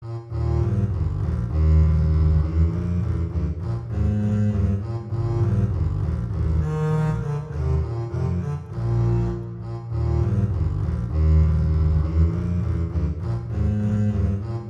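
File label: Duet, horn; Mozart Duets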